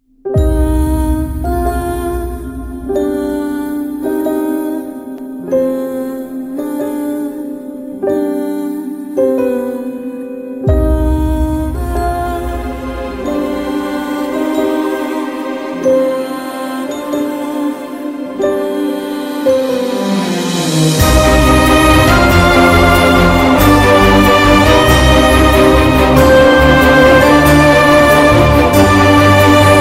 love bgm mobile ringtone; teddy movie; telugu

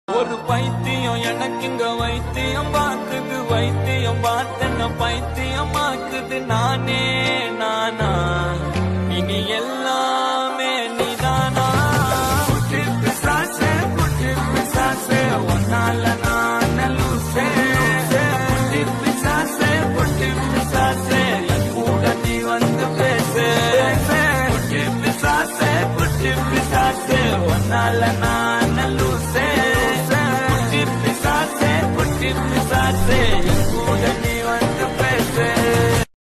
song ringtone in Tamil; pt sir movie

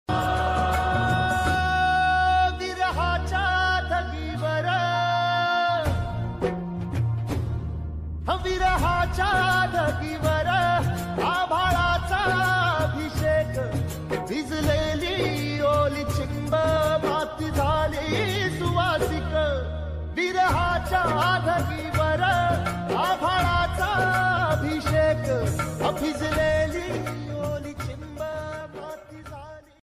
marathi ringtone ringtone music; Shetkari

